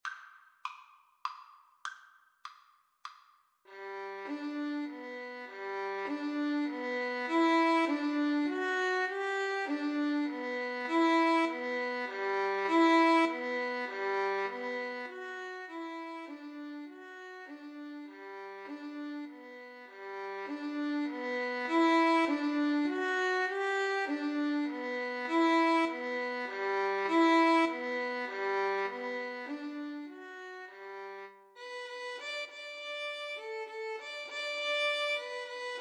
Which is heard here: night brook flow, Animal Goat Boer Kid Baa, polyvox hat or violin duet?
violin duet